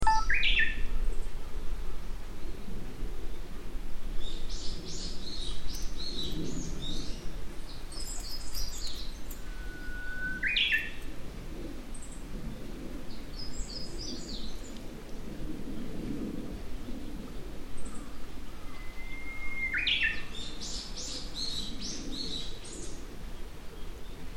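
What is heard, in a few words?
uguisu, Japanese bush warbler; ウグイス; 標高750ｍ〜850ｍぐらいの田園地帯（里山）; ウグイスのさえずりです。; ウグイスは誰もが知っている代表的な春の野鳥で、春先はさえずりが下手で、上手にさえずるのは４月下旬頃になる。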